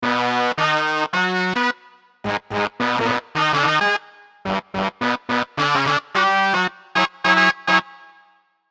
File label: Brass; gforce software